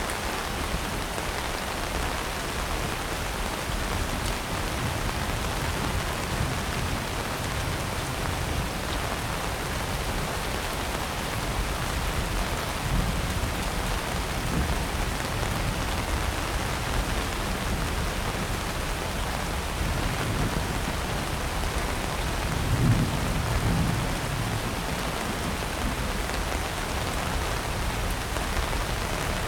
raining heavy loop; anemoi

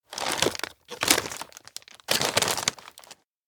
shopping basket put snacks; anemoi

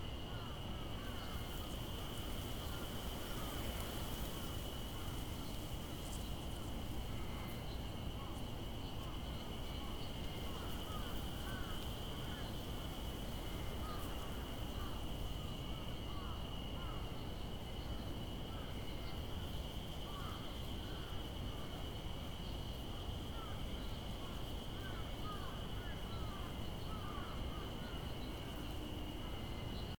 outdoor road dusk

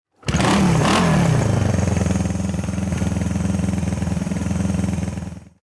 engine rev rumble; anemoi

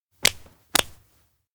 clap two; anemoi

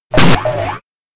elec knead wind; anemoi

